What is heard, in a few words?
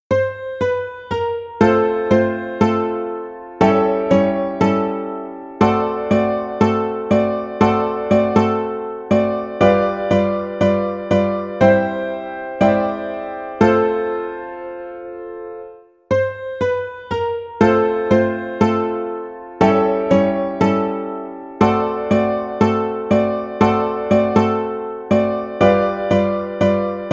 Ukulele